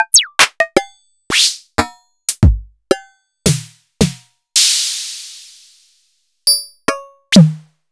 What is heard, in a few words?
Sony DPS-F7 Kit